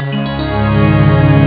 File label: noy string; ST-XX Amiga Soundtracker Sample Packs